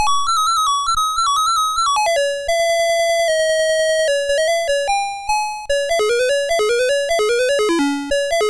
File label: Quari, MOS6581R2